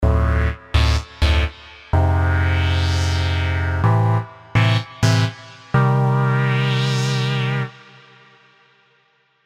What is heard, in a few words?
Stab Dub; tech house; SERUM